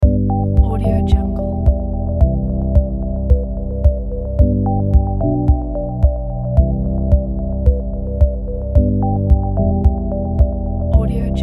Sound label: ambient timelapse; comastudio